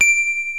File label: VIBES BELLS